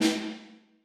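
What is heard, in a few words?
snare right hand p, percussion; Sonatina Symphonic Orchestra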